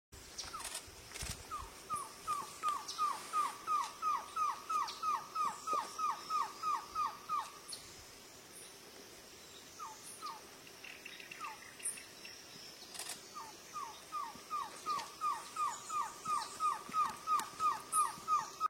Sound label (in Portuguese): canto do surucua pequeno